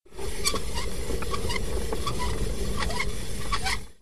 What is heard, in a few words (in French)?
Manivelle